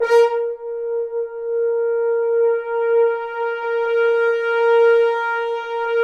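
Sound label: BRS French Horns sfz; Roland LCDP06 Brass Sections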